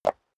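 step high heels concrete single stair up, footsteps; schritte; menschen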